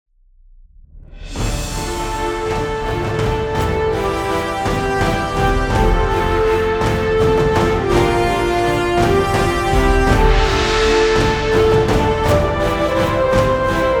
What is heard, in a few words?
Front Line (Orch)